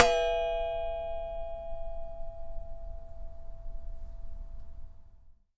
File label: Flick, Japanese Pull Saw